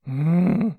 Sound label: Annoyed